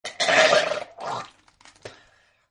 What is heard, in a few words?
Vomit